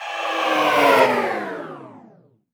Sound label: Misc Short FX